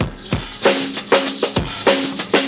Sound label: dirty hiphop, drum loops and breaks, 097 bpm; 091 to 100 bpm